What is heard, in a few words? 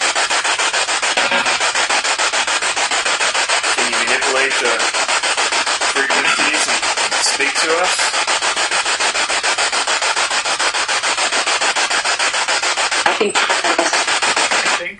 Spirit Box; Attic Small Room